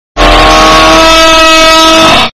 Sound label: spring